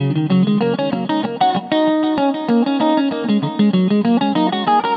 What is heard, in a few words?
durga, stringed instruments; Sonic Foundry (Sony Creative Software); World Pop